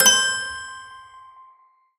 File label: tonal oneshot bell C; Pop; Slate; PASADENA Pop Sample Pack; Tonal One Shots